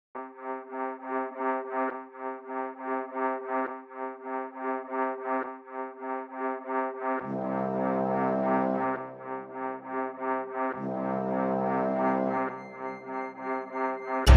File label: Escape No Glockenspiel